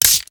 railgun empty

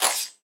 Sci-Fi Weapons-Loading Fx

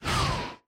Mobile Menu Trials Off

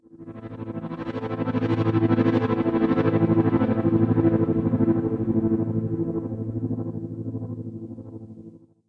shimmer; Inception The App

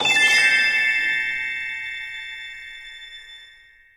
Stinger Eerier; Back to the Future: The Game; Episode 1

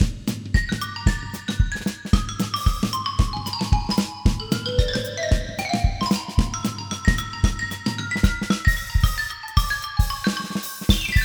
Battle Perc

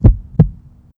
heartbeat slowish

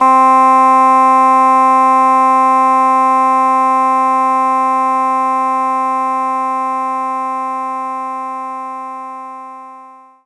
double reed C4; knights try

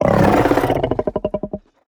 SFX Dino Start Battle; mighty party 2017 gamerip pc ios android

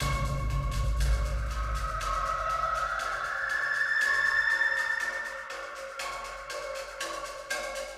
Giant Mouse Above Water; Never Alone

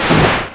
Icicle Crash; pokemon reborn e19 2022

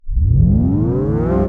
Tape Start

